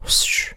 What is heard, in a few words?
wssh